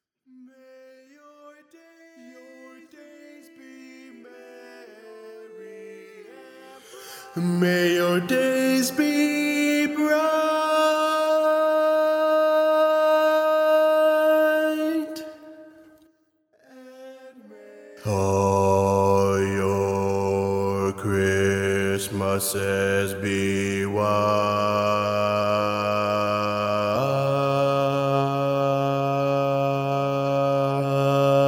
Bass, barbershop

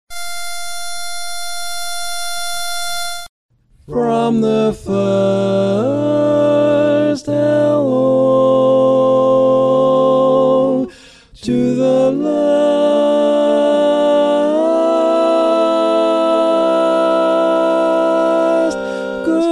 High Tag Lead, barbershop tags; Polecat 2

